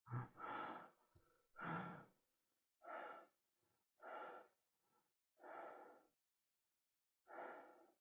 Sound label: Voices male heavy breath